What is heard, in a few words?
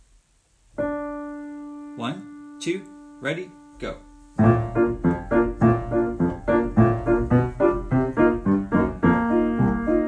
Kids Accompaniment